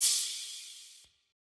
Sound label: crash